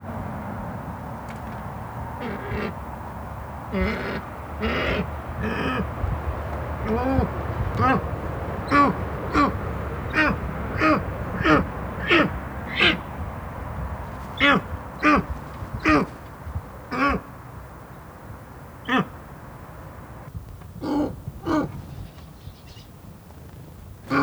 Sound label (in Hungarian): autóforgalom is szélvédő, pusztai sas; állathangok; nyíregyházi zoo 2010